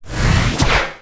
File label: sweep